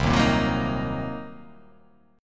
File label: C Minor 7th Flat 5th, chord